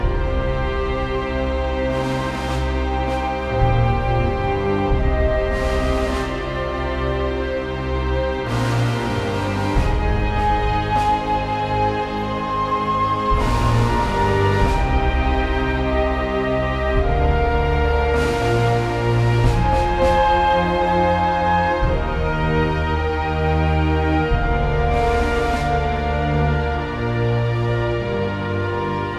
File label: LOADING; brothers in arms earned in blood